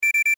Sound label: System pipipi high